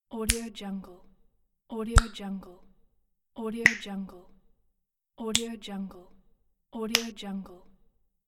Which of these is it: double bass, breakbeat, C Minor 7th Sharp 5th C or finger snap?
finger snap